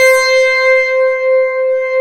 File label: PULSE CHORD, TECHNO; Pro-Rec - Synthsonic; Z1 TECHNO